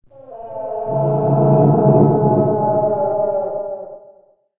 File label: moan; ambient; outdoor